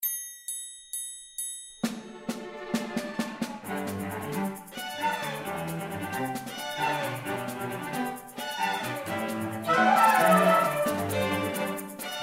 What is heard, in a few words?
funk